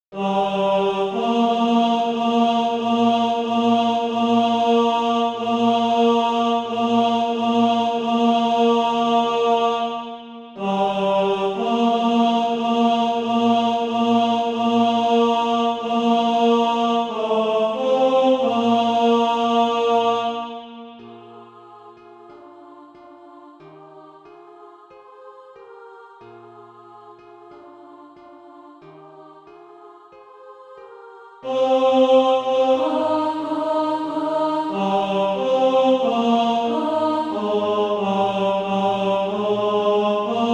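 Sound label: tenor, SATB Practise Music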